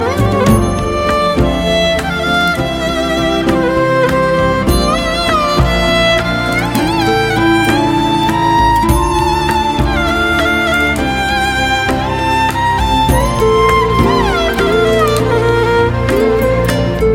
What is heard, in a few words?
soulful violin; Electronica